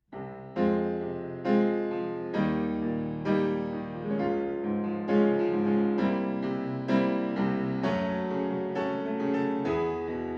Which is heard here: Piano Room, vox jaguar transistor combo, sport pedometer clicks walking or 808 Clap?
Piano Room